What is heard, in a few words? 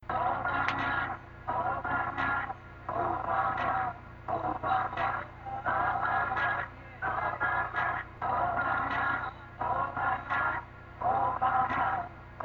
Chant; Obama